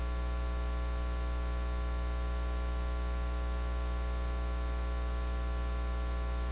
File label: switch